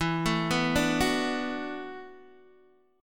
E 7th Suspended 2nd Suspended 4th, down, Guitar, DADGAD